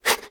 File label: thinking; Scrat